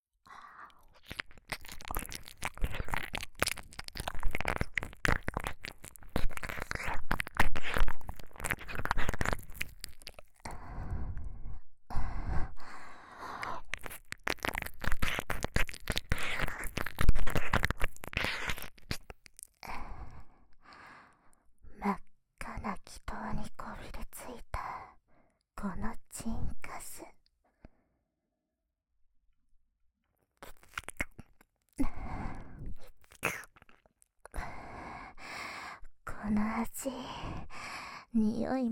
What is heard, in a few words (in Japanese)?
右耳ゲップ責め, ラバー音なし